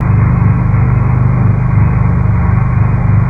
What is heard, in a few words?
launch pad rocket